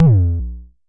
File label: Jomox MBase, drum machine; Jomox MBase 01